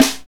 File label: snare, Hip-Hop Rap; Northstar; Drumscapes Roland